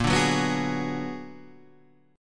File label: B-Minor Major 13th